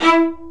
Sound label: Spicato Basses & Celli